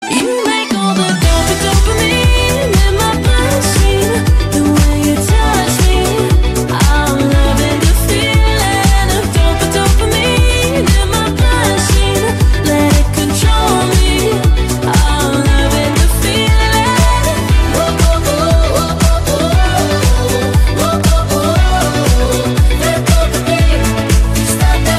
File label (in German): Klingelton